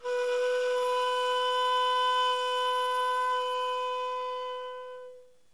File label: Ha Chu Meri, shakuhachi; flute